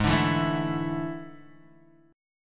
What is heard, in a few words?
A Major 7th Suspended 4th G sharp